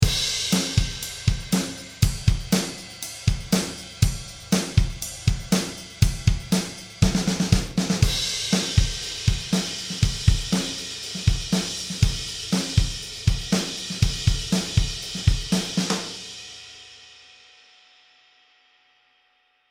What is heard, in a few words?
Drums EQP 800Hz 5kHz; BandW8